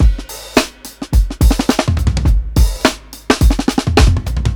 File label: 105 TRIPLET FILLS, DRUMS LOOPS; BASEMENT DRUMS VOL 2